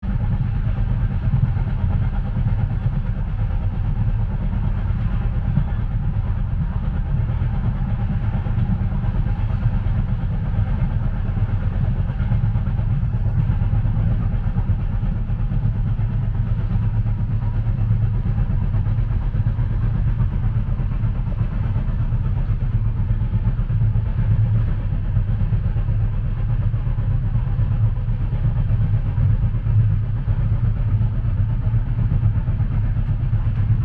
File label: railway train